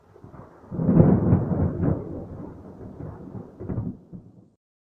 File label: Thunder; Cruelty Squad